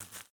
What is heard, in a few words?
Foot Weeds Bare Walk